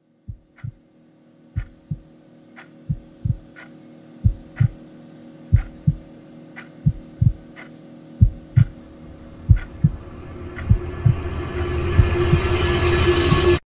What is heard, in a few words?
Heart Beat Clock